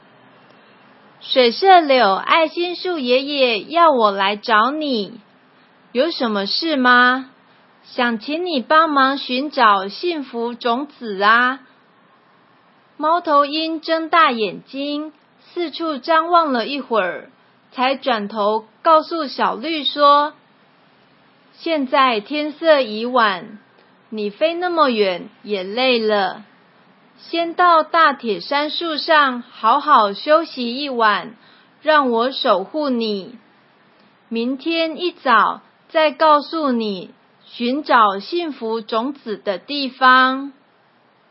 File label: music